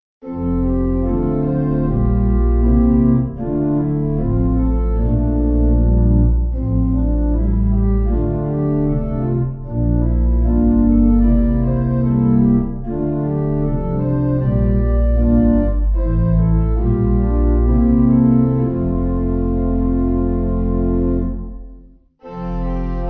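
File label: Pipe, Pipe Organ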